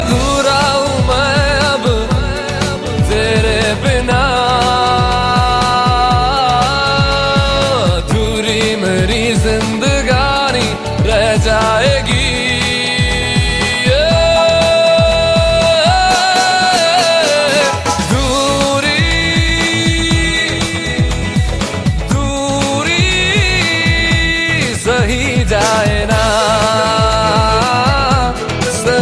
Deep Voice

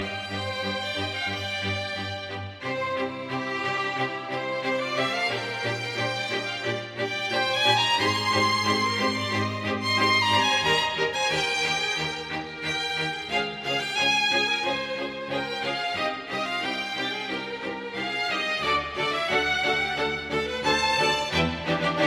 Orchestrated; Zelda

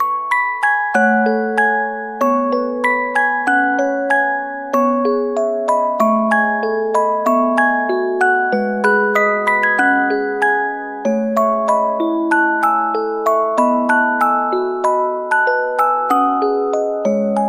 Music Box

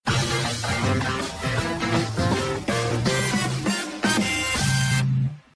Transition Music

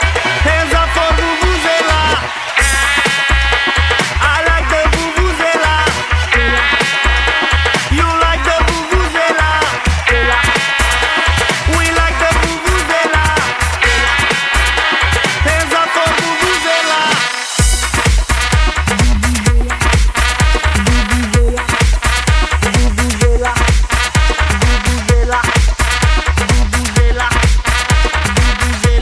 Vuvuzela Song

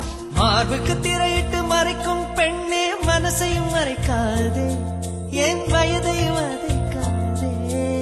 Tamil Lyrics

